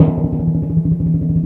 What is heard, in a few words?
Orchestral Perc, Timp FX